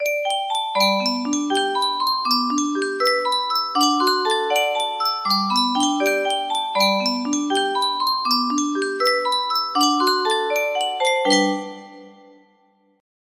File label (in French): yunsheng boite a musique, music box melody